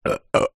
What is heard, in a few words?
human male 37 years old burp